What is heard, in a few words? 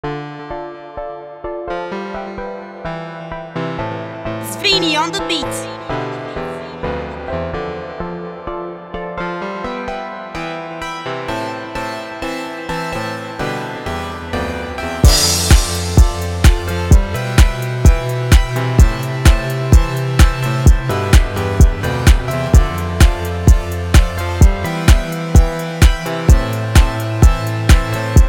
EDM Freebeat